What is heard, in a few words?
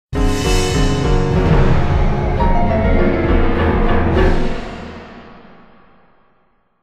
Fight Lose; Mansion; The Da Vinci Code; XBOX